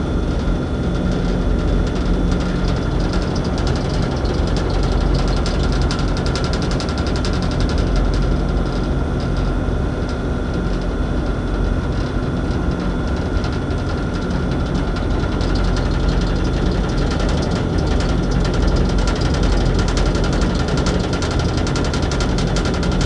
Rattly Air Con; Blackwater; Titanfall 2

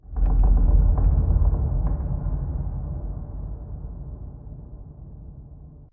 Metal Stress; Boomtown; Sideways Town; Titanfall 2

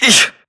grunt hits; Titanfall 2

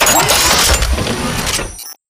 sentry gun deploy; coop; Titanfall 2

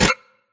Screen Glitch Short; Boomtown; Titanfall 2

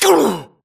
grunt death neck; Titanfall 2

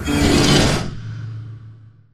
sliding door ext verb close slide; Titanfall 2

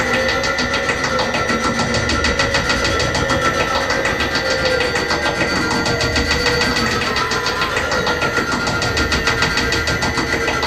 Prop Warehouse Prop Rack Small Move Close; Titanfall 2; Boomtown

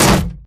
bullet heavyballistic titan; Titanfall 2

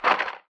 neck snap body fall; airbase; militia sarah; Titanfall 2

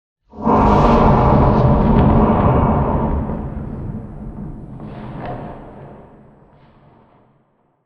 Explosion Rumble Interior Close Wash Tail; Boomtown; Titanfall 2

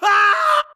grunt death crush; Titanfall 2

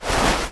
Grunt Mvmt FOL Soft Slow Cloth; Titanfall 2

